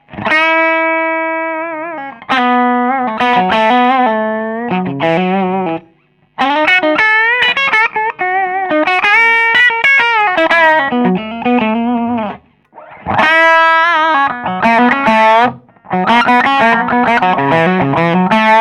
Clean Vol 13 Bright up Mid Boost off Jazz Treble 13 Middle 11 Bass 12 Presence 13.30; ODS; Master 17.00